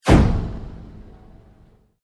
Sweep Mechanical Fast; Foundation; Created sweeps, swooshes and other movement effects; Sweeper; Sound Design

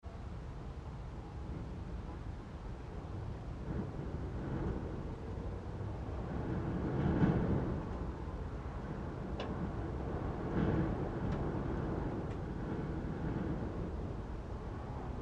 Wind Attic Space Gusts Light; Interior Attic Space; Weather; Rattles; Big Room Sound

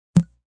Blip; Beeps

